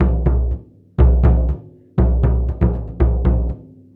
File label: Low Drums, Ethno Drums; Spectrasonics - Supreme Beats - World Dance